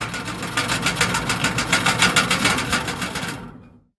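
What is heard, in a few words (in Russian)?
zaschitnye ekrany stalnoy shum; interier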